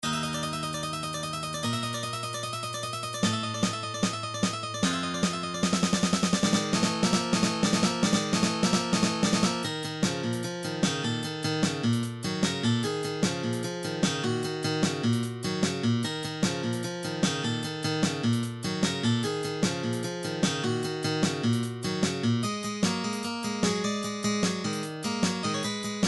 robot master battle; Gameboy; Mega Man 3

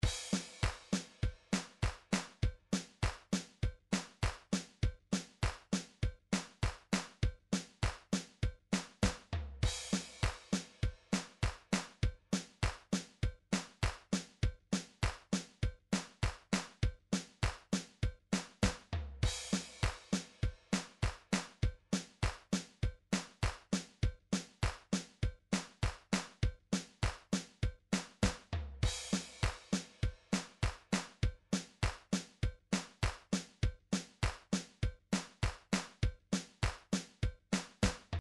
drums country, midi drums

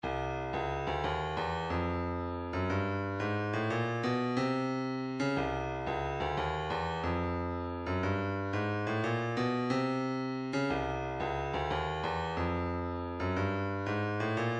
kick, midi

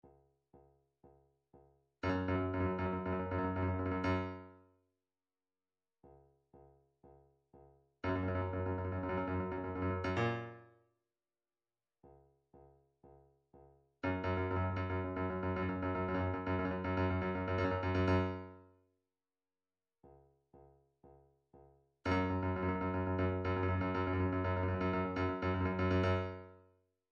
drumtrax toolbox roll

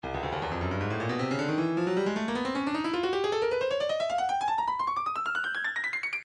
breakbeat midi files 140 170 bpm 155 frenetic; Stylus

